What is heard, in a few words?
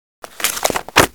Container Close